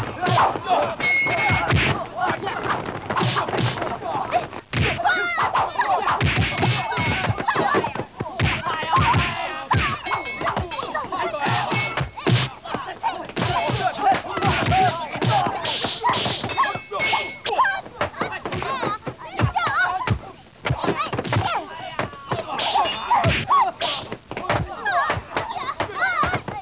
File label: Fight, 打斗音效; 游戏音效; 特酷声音素材库